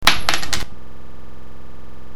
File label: Coin Klunk